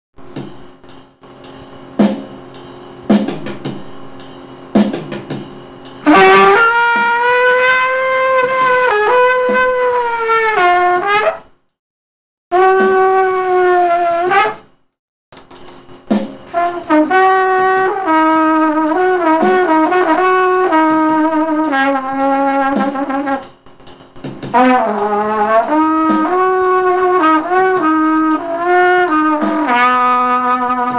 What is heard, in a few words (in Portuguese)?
clarinete e acomp